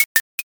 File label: crack